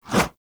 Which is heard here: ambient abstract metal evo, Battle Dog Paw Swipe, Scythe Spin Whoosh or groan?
Battle Dog Paw Swipe